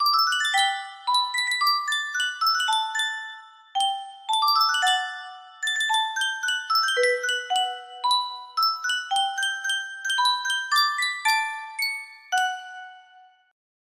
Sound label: sankyo music box, melody